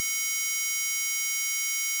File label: noise